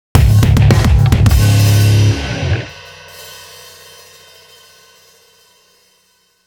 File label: Stinger 'Level-up' (text message tone); Payday - The Heist